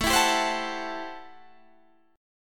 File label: A Minor 13th A Guitar Half Step Down